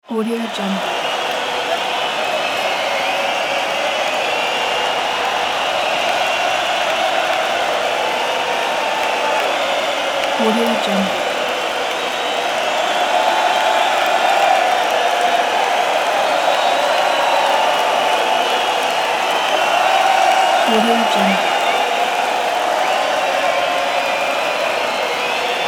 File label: crowd football cheering stadium